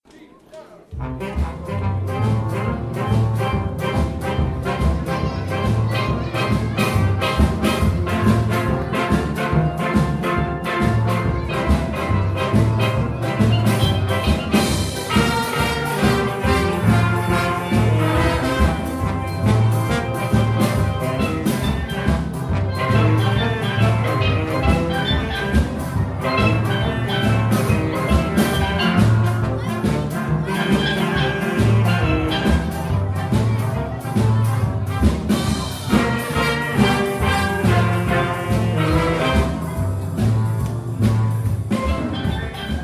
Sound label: Ska Blues